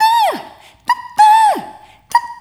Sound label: Rhythmic A